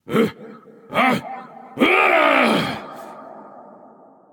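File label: non verbal fear, voice; cernunnos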